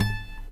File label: ACSSFTFNGR, Guitar